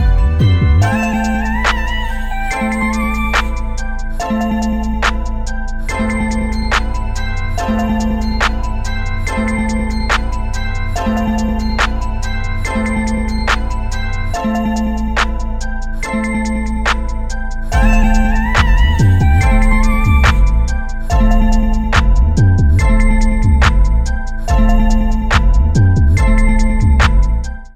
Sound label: meditation ringtone